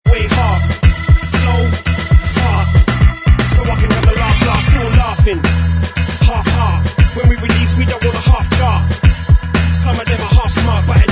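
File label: backing vocals track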